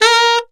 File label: Tenor Sax Portato A#3; DirectWave; Varazdin Orchestral